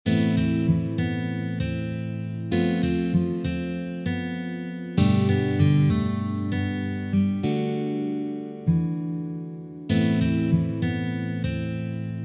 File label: Intro Slow Tempo, guitar